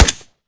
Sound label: fire sup; gmod; arccw uc commons attachments